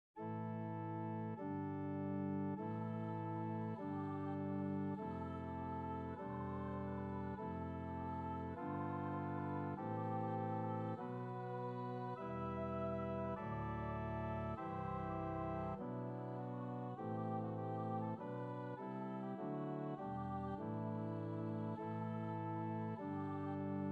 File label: torches bass